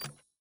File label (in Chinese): 组合按钮音效; nba; 战中